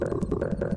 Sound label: 154 moving target, drum loops and breaks; 141 to 160 bpm